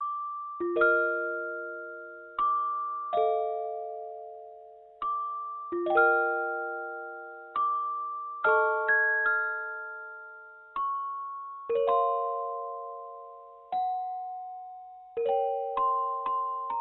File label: music box song